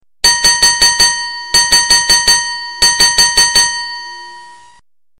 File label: Signal Box Opening